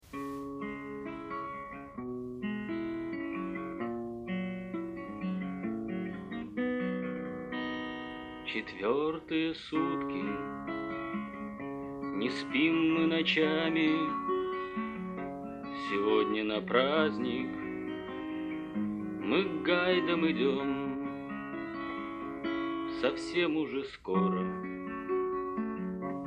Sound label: unplugged